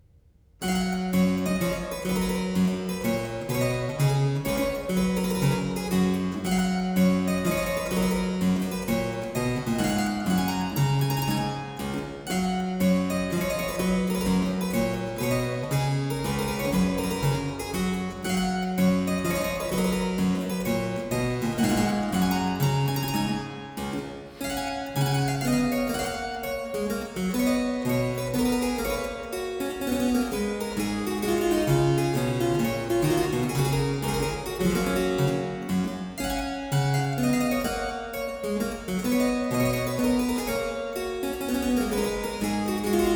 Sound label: Minuet, harpsichord; Complete Chamber Music; Brilliant Classics; Miscellaneous pieces for the harpsichord